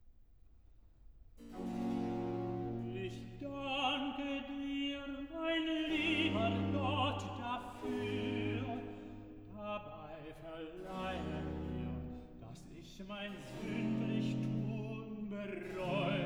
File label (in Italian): Cantata, Recitativo (Tenor); BWV 149 'Man singet mit Freuden vom Sieg'; 'Ich danke dir'; Bach; Bremen; Feast of St Michael & All Angels